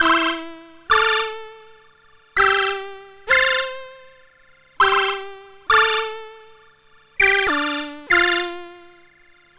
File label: C64 Synth; 8-Bit; Chiptune; Commodore 64